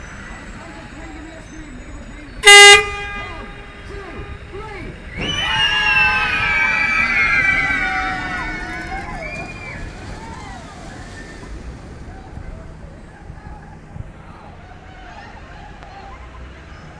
yellow duckmarine albert dock

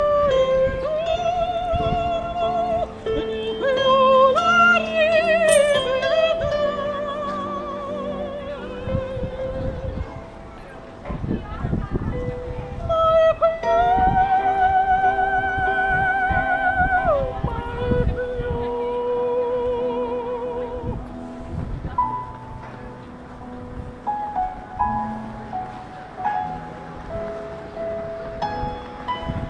street opera singer in palma mallorca

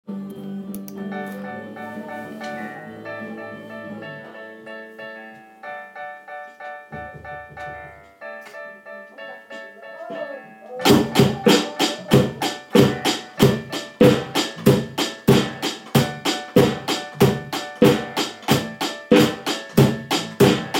drums

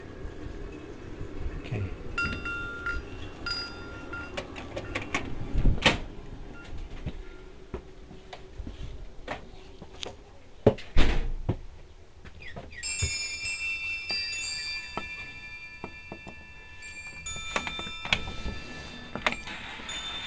wind chimes; at my uncle's house

